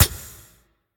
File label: basic hit; smite